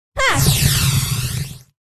mage female buff

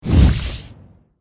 hydra buff in

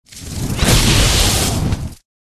Pet Butterfly

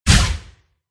dragon blue attack contact bottom

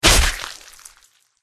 tiger attack contact up

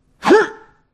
human female attack voice

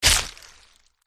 attack contact middle; murgul

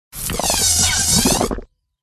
jelly fish

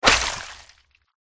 zombie attack contact middle

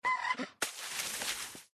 Penguin Die; pinguin

